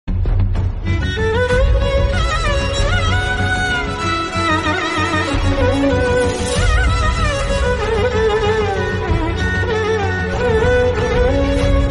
love bgm ringtone; krishnarjuna yuddham movie

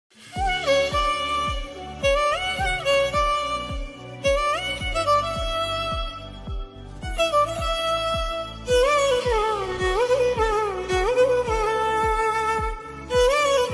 intro bgm ringtone instrumental ringtone; amaran; sai pallavi

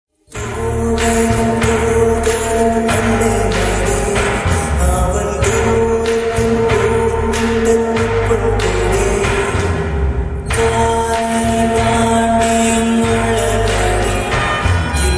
tamil ringtone, love songs tamil ringtone; new tamil ringtone

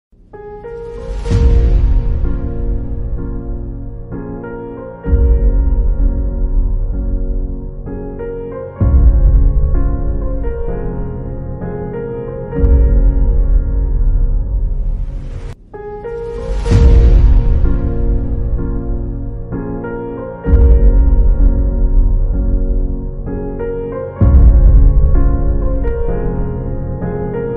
emotional bgm; kgf

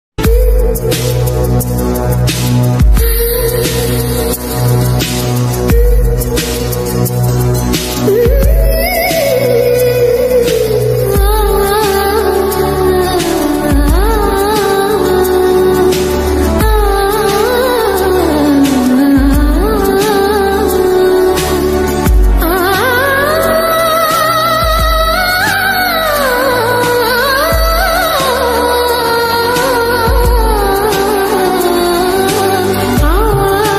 humming

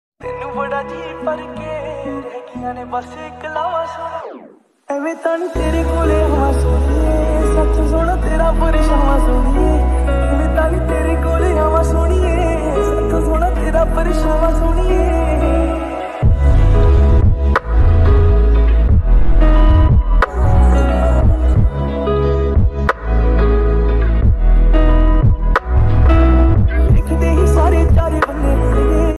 lofi mix ringtone

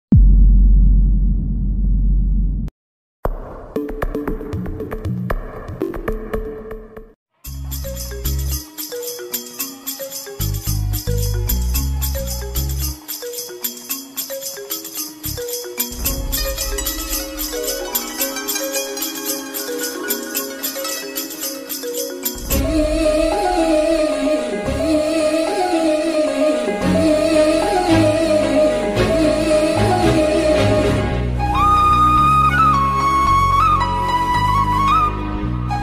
love bgm ringtone; sandakozhi; hema intro bgm

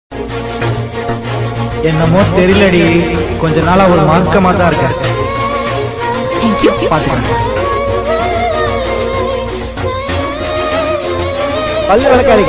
tamil love bgm ringtone

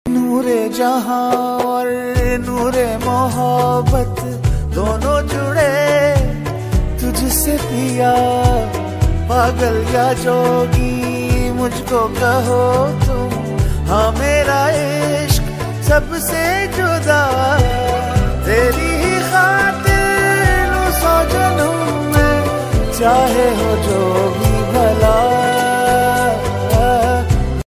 ringtone bgm beats

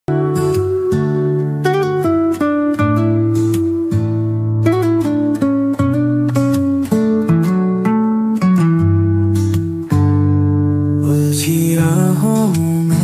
guitar ringtone, bgm instrumental ringtone

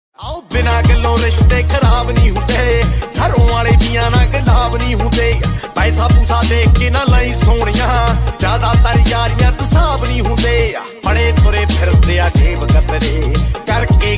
new punjabi ringtone

